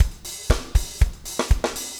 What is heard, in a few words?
4 on floor 120, loops, 120bpm